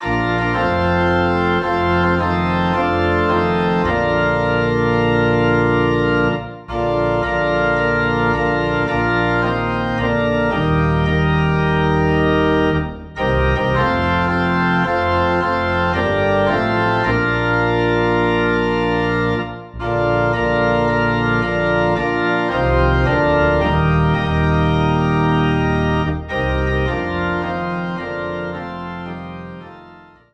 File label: Organ Low in B Flat